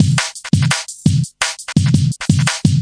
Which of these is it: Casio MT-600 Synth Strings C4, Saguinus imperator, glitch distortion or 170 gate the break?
170 gate the break